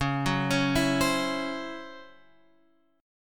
Db Minor Major 7th Double Flat 5th Db down Guitar Open F; arpeggiated; DbmM7bb5; JGuitar